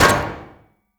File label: cell wall door deploy; Rust